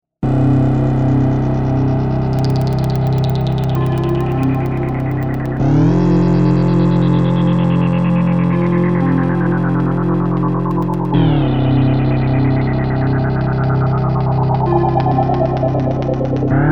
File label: digitone